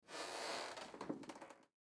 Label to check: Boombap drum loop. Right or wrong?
Wrong